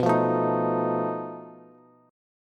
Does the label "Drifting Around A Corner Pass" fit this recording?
No